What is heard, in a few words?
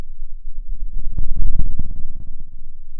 pop, Sound of a dinosaur